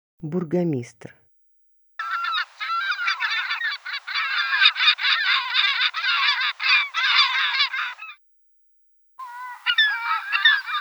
burgomistr golos

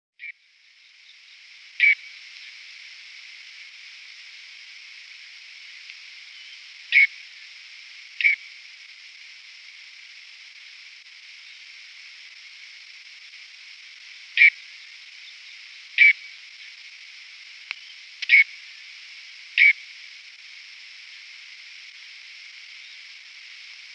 beloschekaya krachka golos

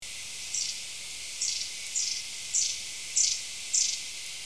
dlinnohvostaya sinica golos

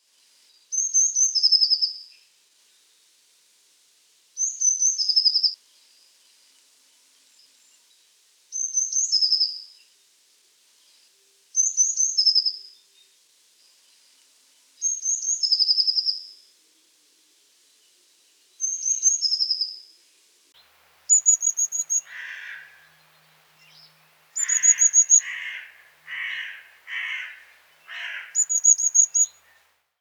obyknovennaya lazorevka golos

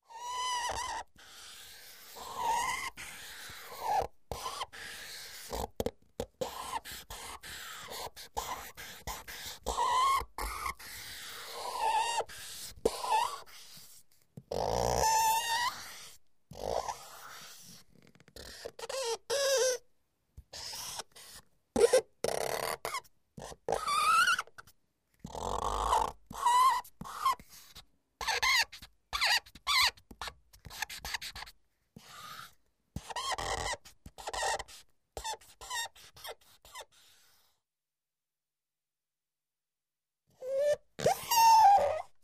zvuki skripa

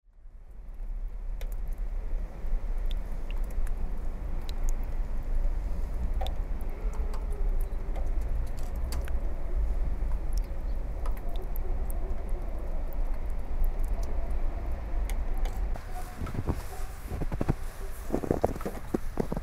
zvuki goroda

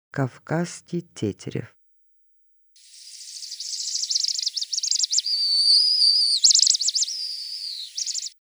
kavkazskiy teterev golos

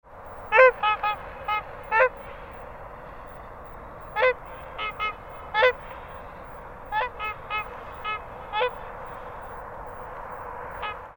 lebed trubach golos

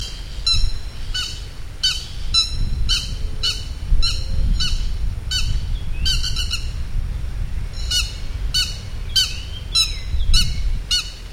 seryy chibis golos

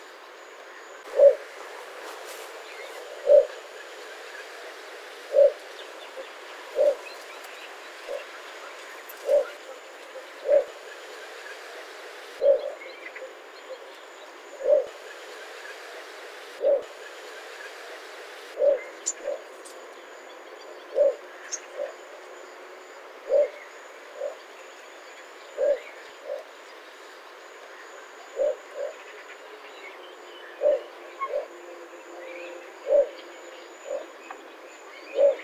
malaya vyp golos

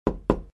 zvuki stuka

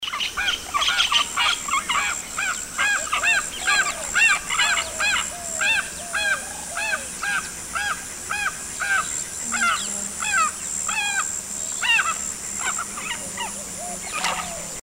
orlan dolgohvost golos

